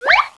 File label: Slide; Princess and the Pea; SoftKey